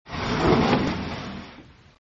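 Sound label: fusuma sliding door; husuma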